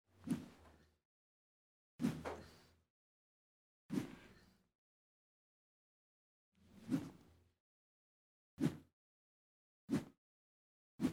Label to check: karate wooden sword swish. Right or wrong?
Right